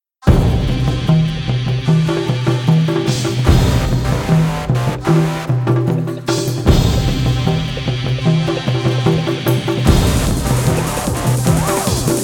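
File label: Fast High Action Rhythmic Variation; Iron Soul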